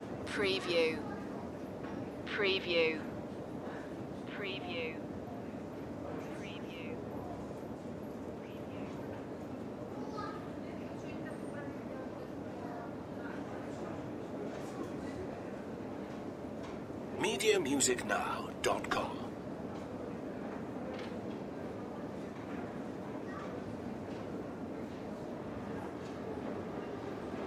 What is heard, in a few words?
metro station moscow